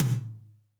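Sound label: tom, real mega drums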